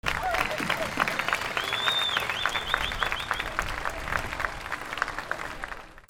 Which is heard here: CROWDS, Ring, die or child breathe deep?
CROWDS